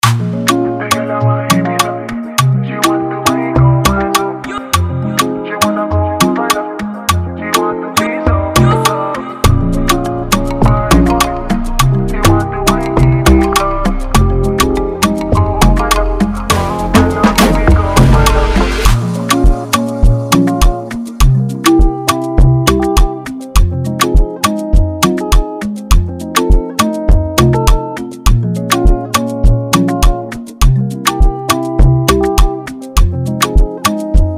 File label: Afrobeat Type Beat; Freebeat Spin